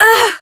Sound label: Generic Short Pain, Operator Voice